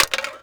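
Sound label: bouncy aluminium can collide; bouncyobjects; Tony Hawk's Project 8; XBOX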